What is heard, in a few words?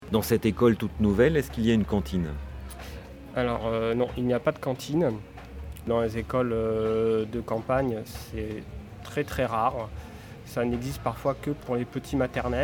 EDM